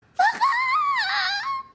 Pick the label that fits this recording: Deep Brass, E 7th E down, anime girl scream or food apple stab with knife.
anime girl scream